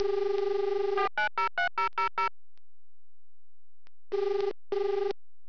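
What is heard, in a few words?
call waiting; E10B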